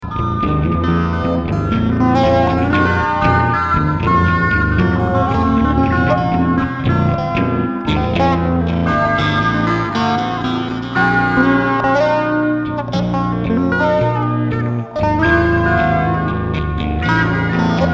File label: zipper loop